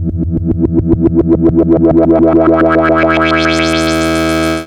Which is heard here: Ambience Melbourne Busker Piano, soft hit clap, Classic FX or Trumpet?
Classic FX